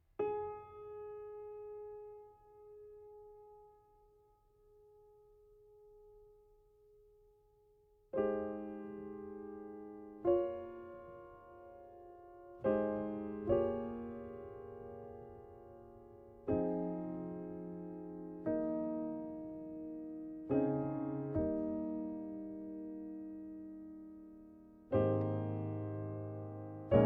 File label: Piano